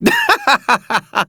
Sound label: laugh; bb hazama